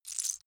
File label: lego bricks small handling single